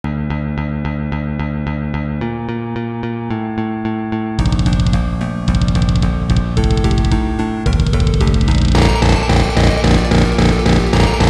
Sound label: Instrumental